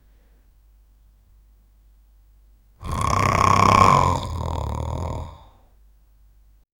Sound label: a generic snore, sleep